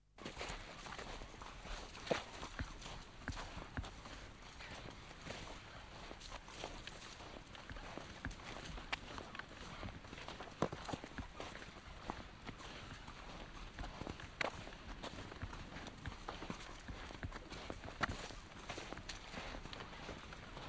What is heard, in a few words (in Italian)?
passi tra cespugli